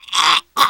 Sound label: choke, teengirl; player; survivor; voice